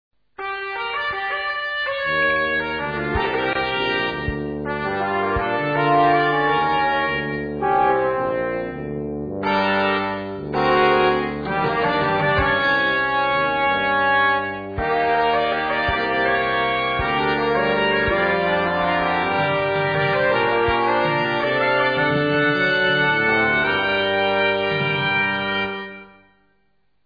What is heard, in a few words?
jingle